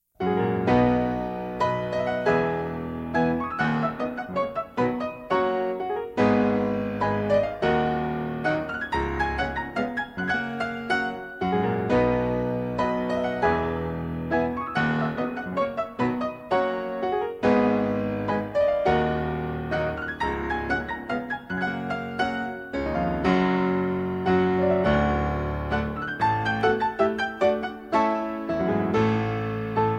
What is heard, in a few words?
waltz